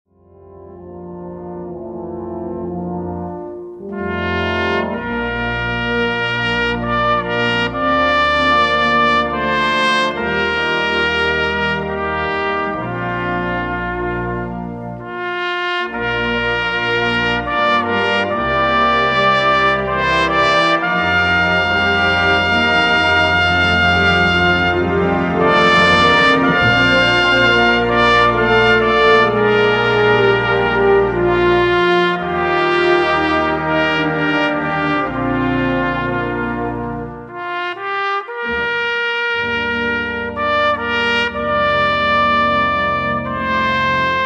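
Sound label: Trp Solo, trumpet; Amazing Grace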